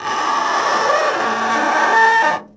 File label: Scrape Metal On Metal